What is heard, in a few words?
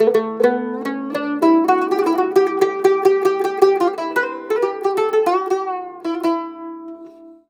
CUMB, CUMBUS, Mediteranean Traditions